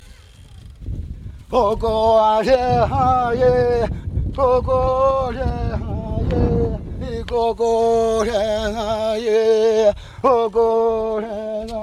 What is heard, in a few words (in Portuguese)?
timbiras